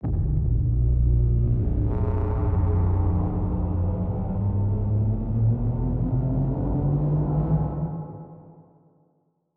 psi ship colossus open; Stellaris; shroud; ships

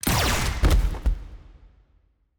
plasma pistol; rifts; Stellaris; astral planes

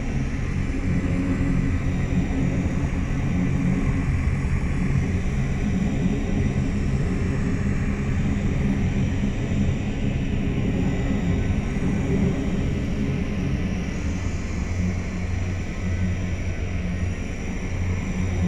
ship shroud seal idle; Stellaris

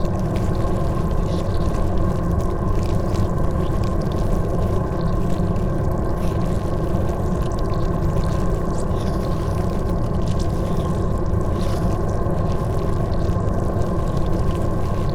ant ship mauler idle; Stellaris; biogenesis; ships

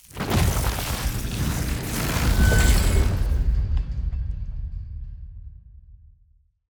tendrils chains; rifts; Stellaris; astral planes

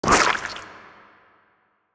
big legs together; Stellaris; biogenesis; ships

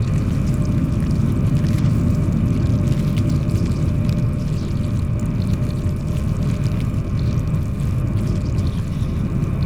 ant ship strikecraft idle; Stellaris; biogenesis

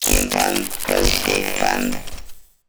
lithoid portrait machine; Stellaris; lithoids; portraits

